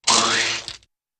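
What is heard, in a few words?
Spring Zing With Squish, sequences; Spring Zing with Squish Overlay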